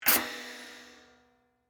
Air Insert Valve, Industry, Air, Insert, Air, Compressor, Valve; Air Pump Sound Effects; Blast Wave